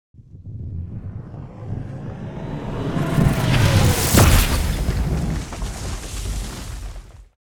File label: Magic explosion huge magic spell hit fantasy